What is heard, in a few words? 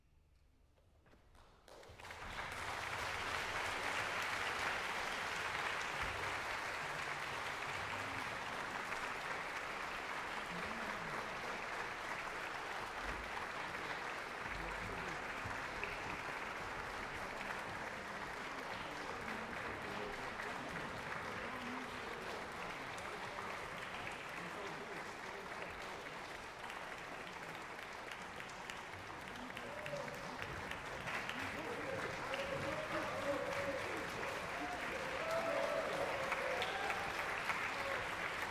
Applause